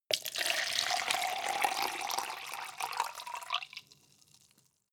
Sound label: cup plastic pour hard